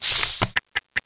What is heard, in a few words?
riflegrenade equip; midnight guns